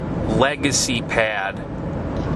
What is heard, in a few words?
Legacy Pad